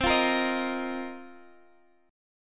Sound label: F 7th Suspended 4th C